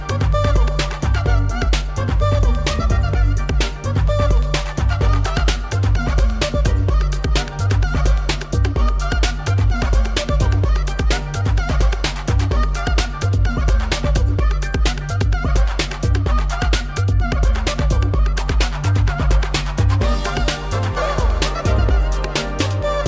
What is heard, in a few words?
street beat